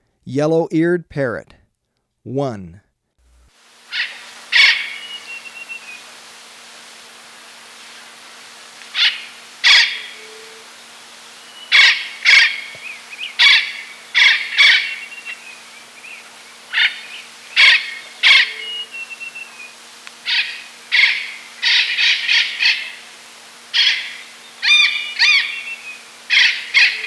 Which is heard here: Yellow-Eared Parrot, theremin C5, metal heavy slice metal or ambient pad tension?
Yellow-Eared Parrot